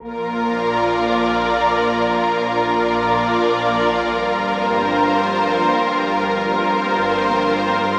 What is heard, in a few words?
120 Synth Pad Loop DRY Gm; Sun